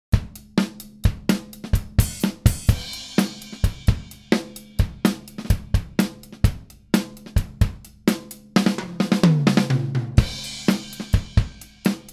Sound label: Flat Drums Silver73 Mid Group Bus